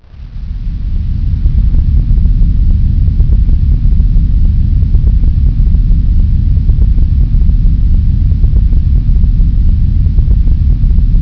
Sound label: ship